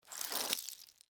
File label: Scrape Penne Rigate; Texture; Scrapes (Sound Effects:Objects); BlastWave